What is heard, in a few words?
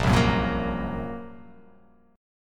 A sharp Suspended 2nd Sharp 5th